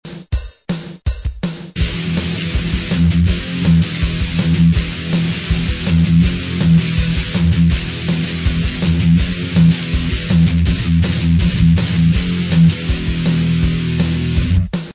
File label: rp200 thrash